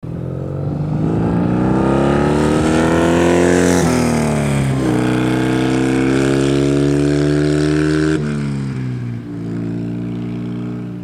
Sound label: BMW R51 3, motorcycles